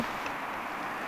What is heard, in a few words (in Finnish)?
onko tuo linnun aani